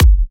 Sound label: Bassdrum